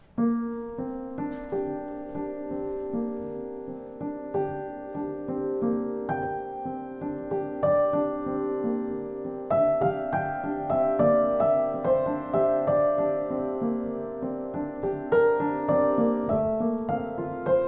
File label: Royale RS 4, piano